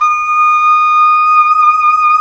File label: SAX SOP MF, Soprano Long; Roland LCDP07 Super Sax